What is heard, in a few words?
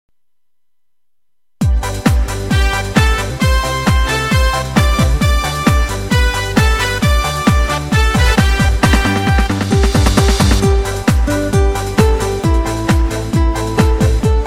beat instrumental